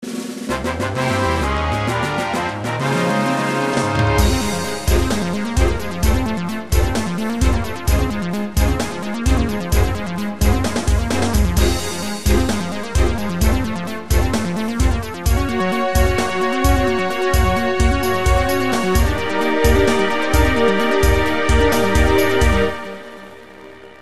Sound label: Fanfare; FFVII